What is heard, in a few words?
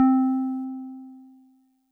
DigiPianet DX100 C3